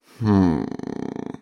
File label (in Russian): brezglivoe vorchanie